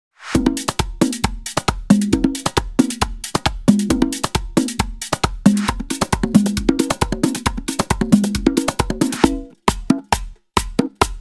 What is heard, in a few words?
Mambo for Merengue Loops 135BPM